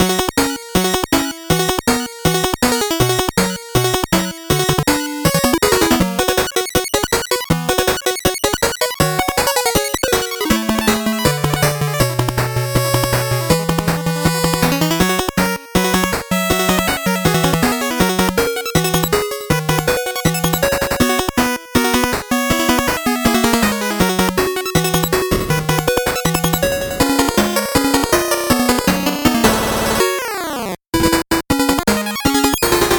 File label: 8bit bgm normal